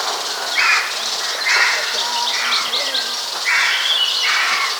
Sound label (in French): vautour moine